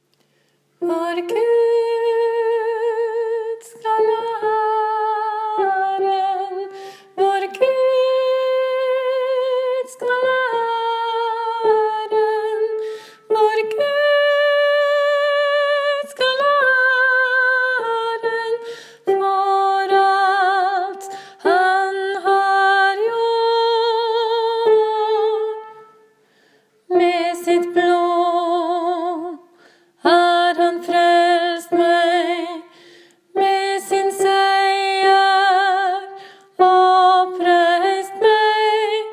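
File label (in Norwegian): vår gud skal ha æren alt; åfjord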